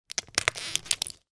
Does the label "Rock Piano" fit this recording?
No